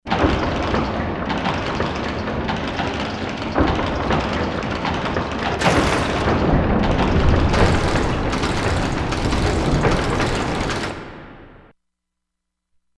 Industry; Environment

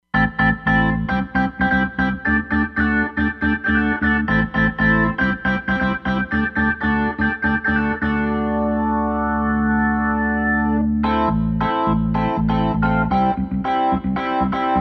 Euro Organ, Roland Fantom XR

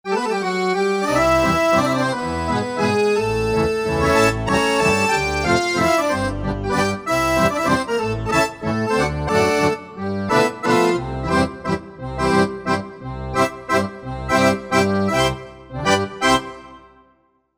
Full Accrd, Korg MSC-9S Organ

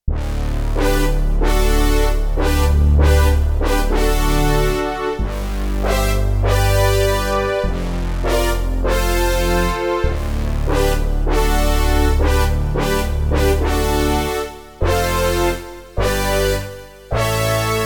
Mr Brass, Roland JD-800; Factory Patches

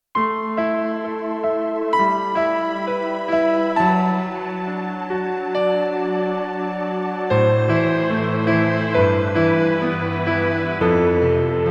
Ac Pia + Str, Roland D-70; Factory Performances

